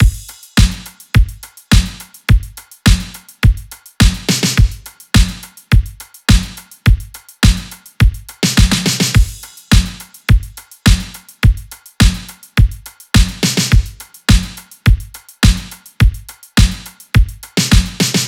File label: drums 105, Drum Loops, Full Drums; Slate; SD MIDNIGHT Sample Pack